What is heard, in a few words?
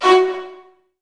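viola marcato F#2 left, Virtuoso Strings; ILIO; Kirk Hunter Virtuoso Strings VOL-2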